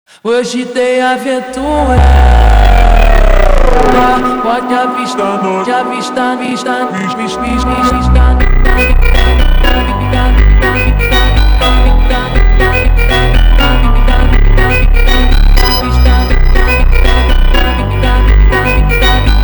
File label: Slowed